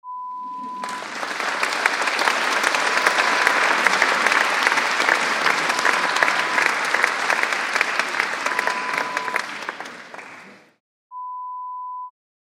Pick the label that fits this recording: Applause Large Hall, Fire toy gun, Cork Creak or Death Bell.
Applause Large Hall